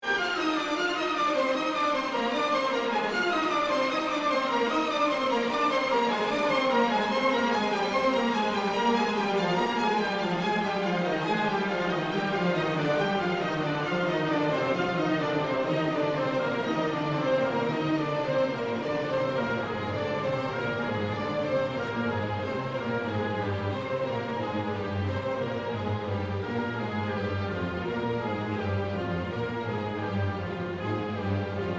rallentando

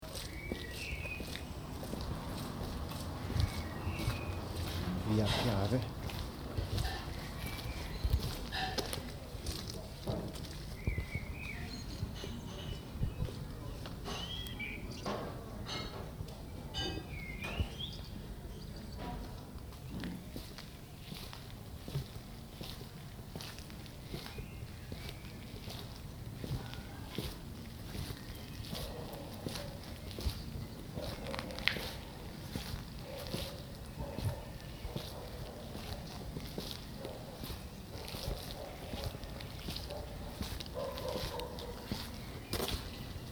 via PIAVE

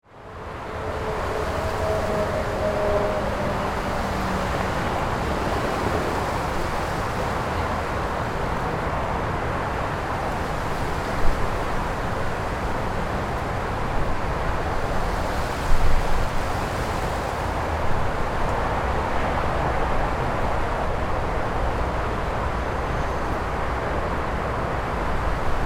allemany farm

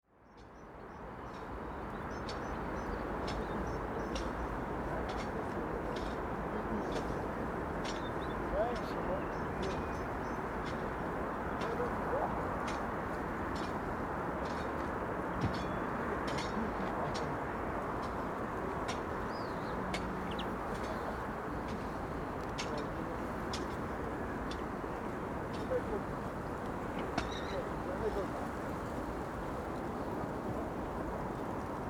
chelas

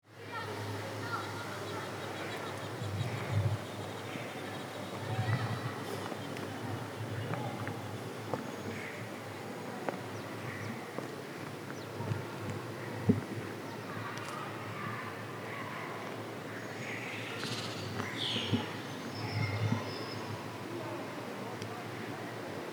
kasbah; zerka; Ainzerka point 8

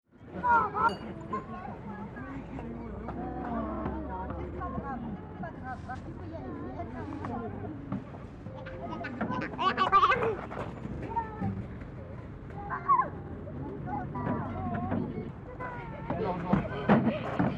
Reverberation

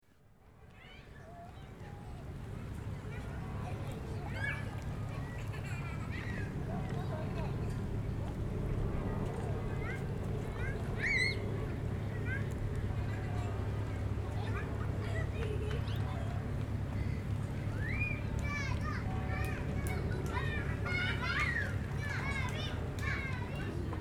locarno

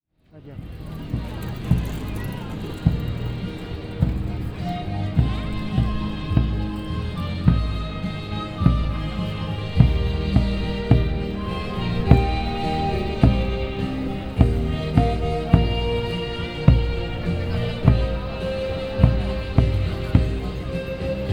trans urban indian soundmark; bogota

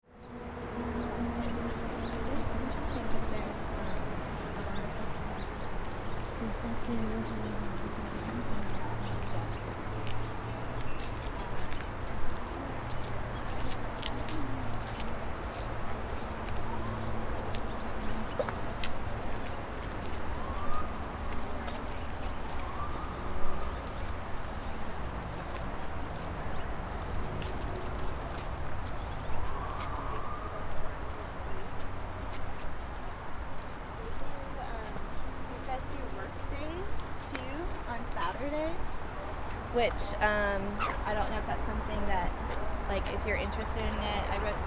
allemany farm

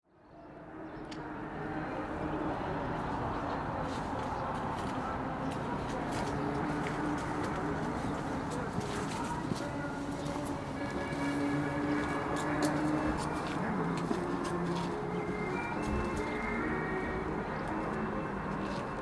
chelas

